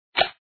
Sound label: token Pop; athlete